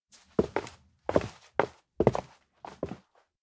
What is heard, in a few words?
steps indoor office pass by; aino; chiharu; anemoi パッケージ版 1.1